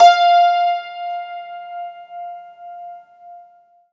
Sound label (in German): HACKBRETT F2; Alpine Volksmusik